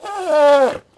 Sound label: Elephant Blow; Civ4 warlords; Resouces and Improvements